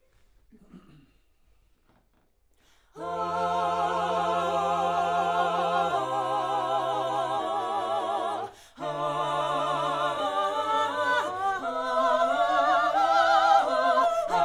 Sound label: Backs AKG LEFT